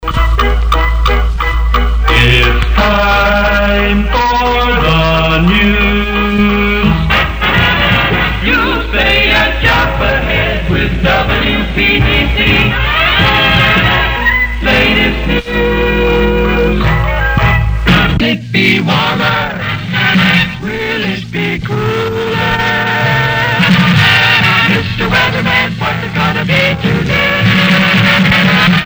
jingles montage; crc series 3

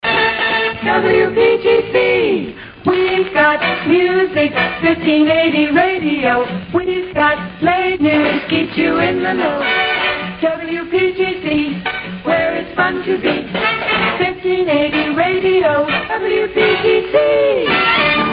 jingles highland weve got music; pams series 9

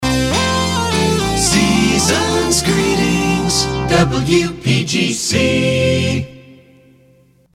christmas kit deck the halls fast, jingles

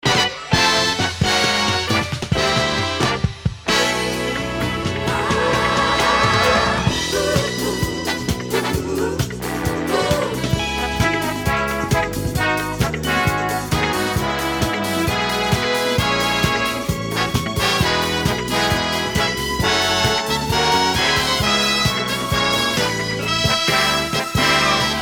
christmas kit disco auld lang syne

